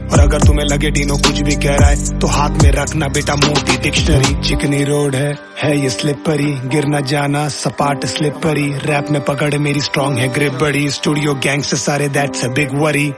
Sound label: Rap Song